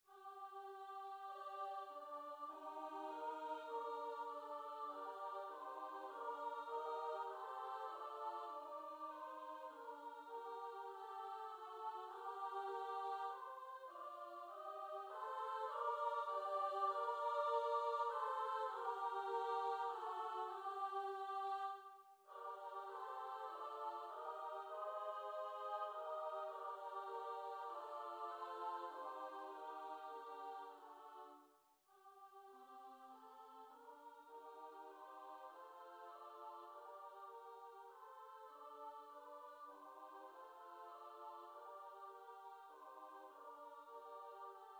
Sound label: midi; ingegneri; swiatla nocy